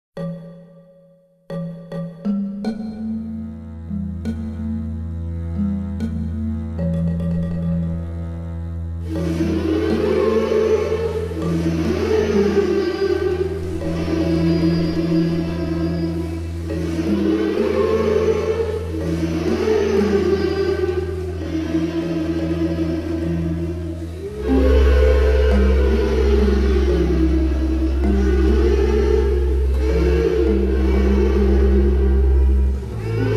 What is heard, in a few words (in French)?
vocalises